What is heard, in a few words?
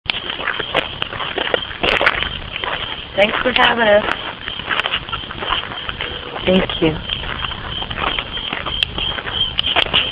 sinister laugh